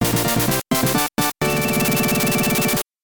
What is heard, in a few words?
round clear